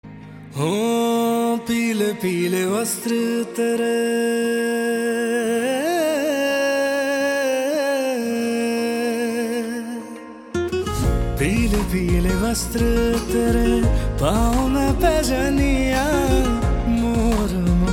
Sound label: Slowed Reverb